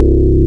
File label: Bass